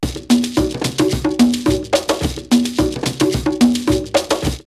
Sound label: Luanda 108, drumloops World&Ethnic; X-Static Goldmine